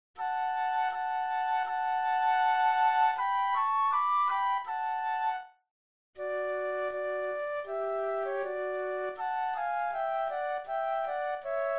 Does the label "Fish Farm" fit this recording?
No